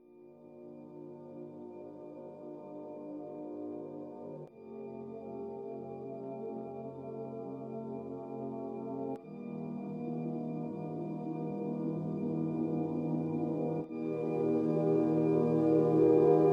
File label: Lo Fi Hip Hop